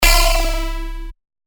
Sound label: C#, bass shots; Dynamo; revealed bass shots vol 4